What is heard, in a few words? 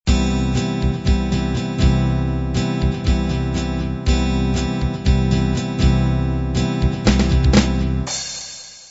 classic beat intro